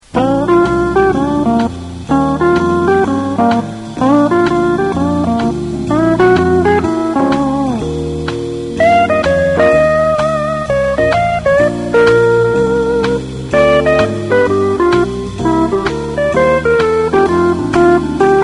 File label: Blues To Use Solo